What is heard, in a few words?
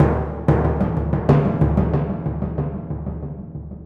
Timp